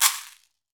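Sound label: Latin Shakers, caxixi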